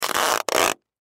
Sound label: Packaging Tape; Tape Dispenser Tape Box Long Piece; Offices; Blastwave